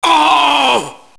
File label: military die; paranoia